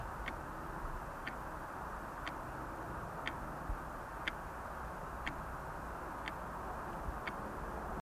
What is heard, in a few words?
Quartz Clock